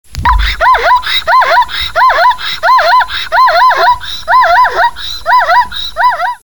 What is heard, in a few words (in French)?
zebre